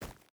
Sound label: footstep barefoot forest run; Rust